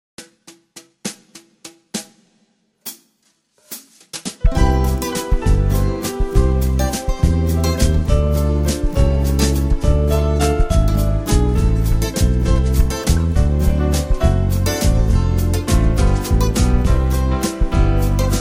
l'oiseau musette

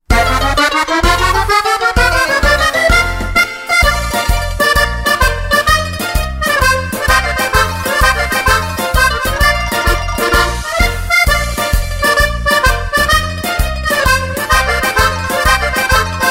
mandoline

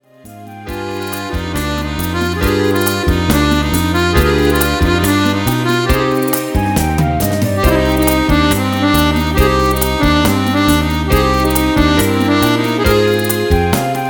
rumba

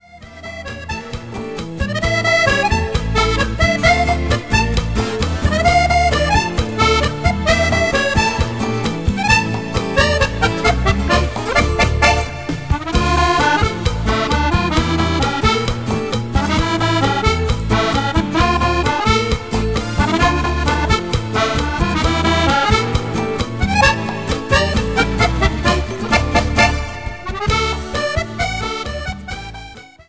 danse latino

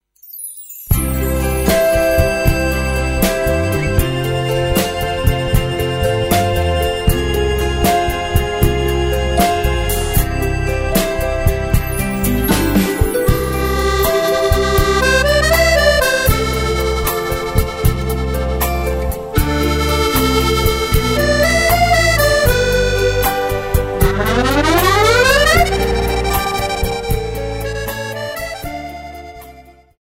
seduction melodie

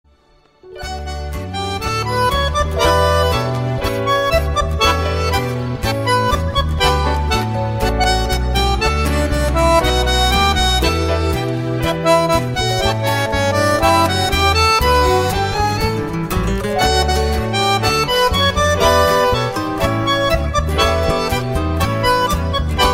tango

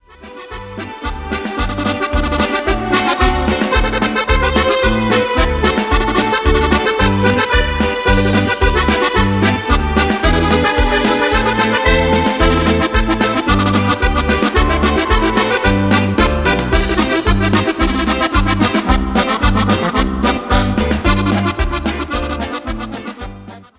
polka

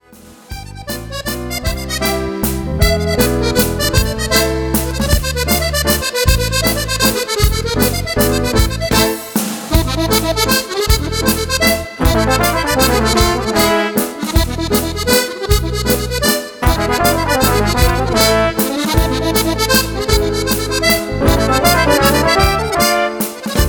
mazurka savoyarde

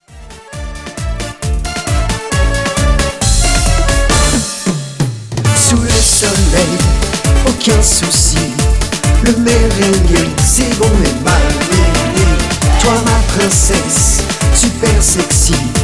merengue

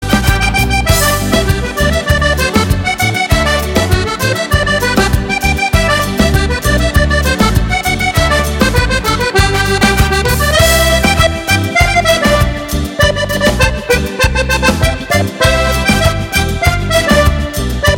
baion

